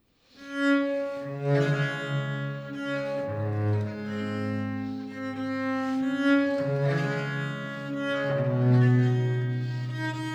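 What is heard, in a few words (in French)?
Pièces De Viole, Improvisation Sur Les Folies D Espagne; Marais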